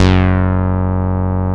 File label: MC 202, BASSES